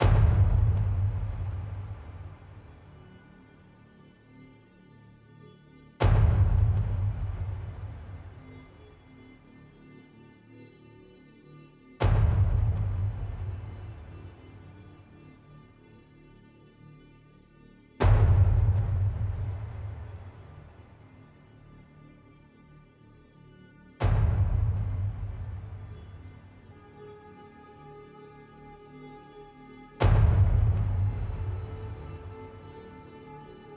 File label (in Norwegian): alle instrumentene